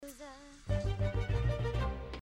mood energized; sims 4

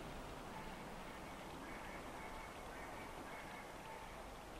bark; limbo

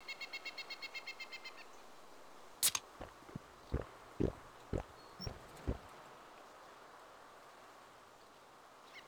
action drinking; dayz standalone

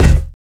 physics cube; portal 2

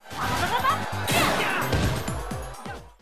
arms special ready

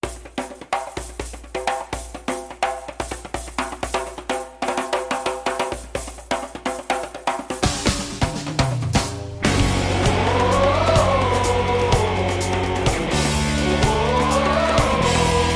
arms theme

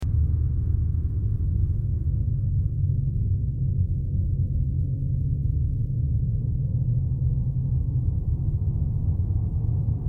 music abyss; Life is Strange 2; gamesoundopedia

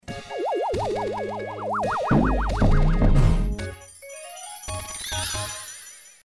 item arrived at spaceship; gamesoundopedia

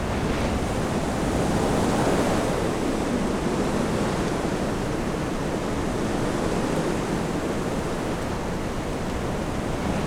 wind; Owlboy

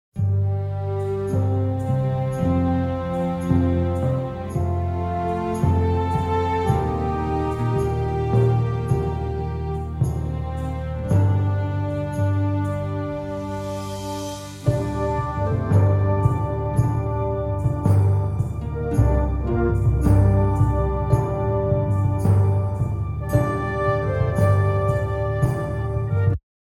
music forest day; baldursgate

